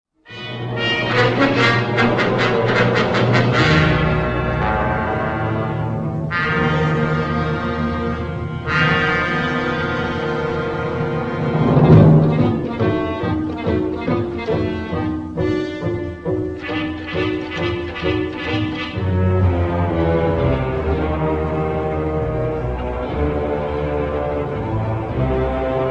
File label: bgmusic; witch